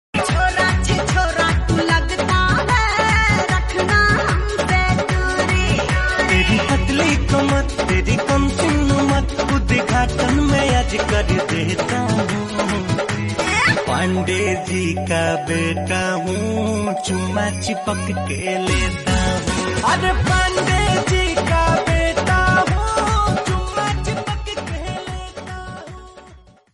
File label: bhojpuri